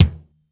FULL KIK; Hollywood Edge; The Big Bad Beatmachine

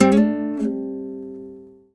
FOURTH CHOR, Nylon Guitar

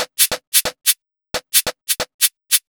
DRMS, Underground Hip Hop

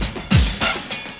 loop, 200 BPM; Ueberschall; Drum & Bass Resonance

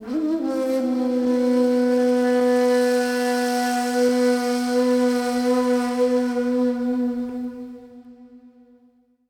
SIERRA FLU

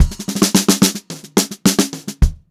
109 GR FILL, Funk Groove Drumloops; USB Soundscan vol.38; AKAI; 90 s Sample CDs

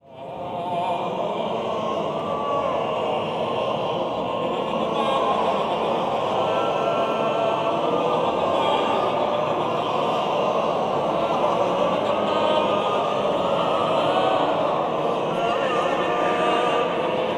warm up, voices

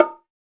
PRC BONGO H

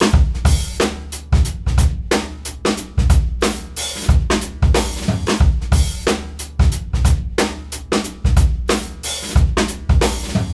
SLOW MOTION 91, OFFMIKE DRUMS

